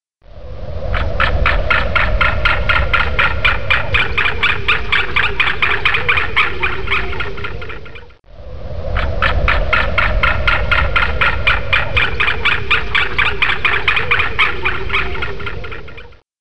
White tailed Eagle

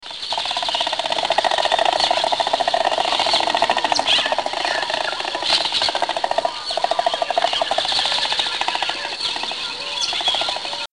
Jabiru

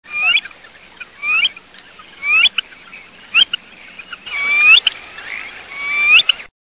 Eared Grebe

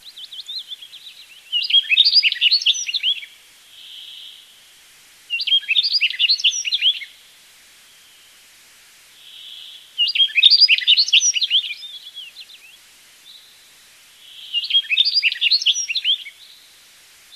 Rustic Bunting

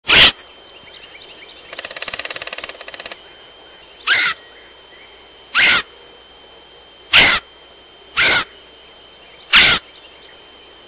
Red headed Woodpecker

